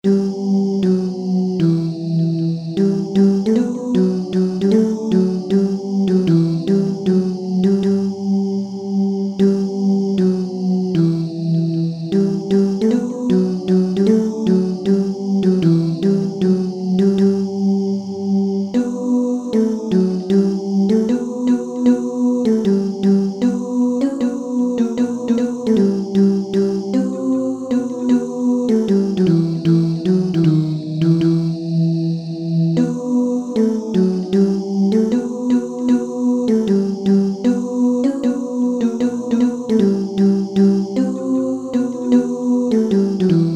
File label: Tenor; Lully